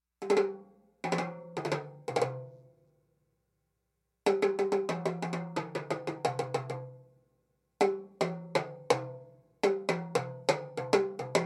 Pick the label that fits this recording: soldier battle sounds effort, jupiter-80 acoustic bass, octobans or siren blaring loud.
octobans